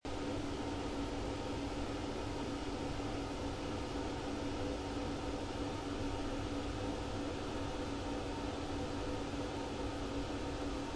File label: noisiest toilets in Britain